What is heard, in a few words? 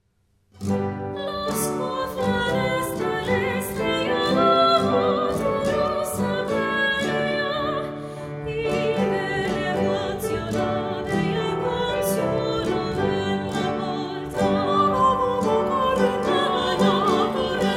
Choir; Los Coflades De La Estleya; Brisbane Concert Choir